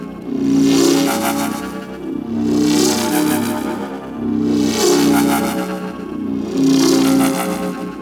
Hologram Loop; Ambience; Props; Corpus Ship

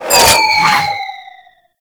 Grenade Proj By; Warframe; Lasrian Soldiers; Weapons; Lasrian Goonadier Grenade

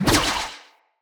Spider Wire Throw

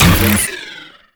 Hack Minigame Click Fail; Duviri; Warframe

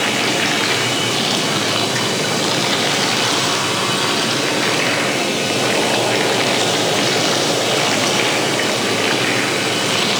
Swarm Nest Stage Three Idle Loop; Entrati

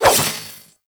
Nikana Swing E; Tenno; Revenant

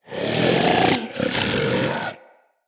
Vocal Breathe Slow, Hunting Critters; Characters; Vulpaphyla

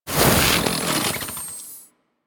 Kusarigama Extinguish

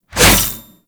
Briefcase Scythe Whoosh; Warframe; Corpus

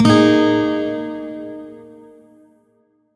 Shawzin Yo Chord; Warframe; Lotus Shawzin

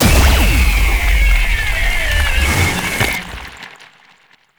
Magnetic Manipulation Cast; Infested; Warframe; Infested Lich; Drill Bit

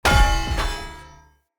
bird repulsor destroy chunk surface impact; Objects; Thawtide

